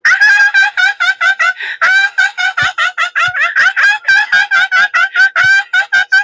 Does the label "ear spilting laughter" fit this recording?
Yes